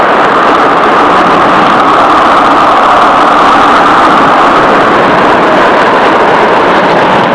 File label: STORM